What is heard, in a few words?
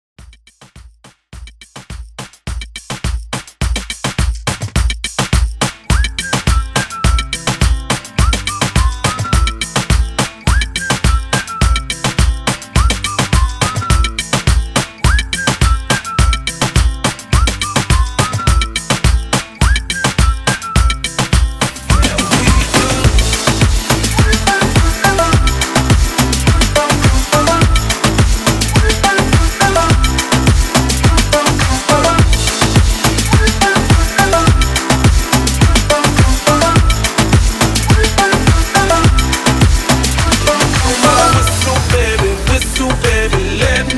WHISTLE MOOMBAHTON 105 BPM